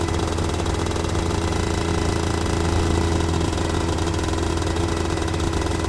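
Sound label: KART interior OFF VERY LOW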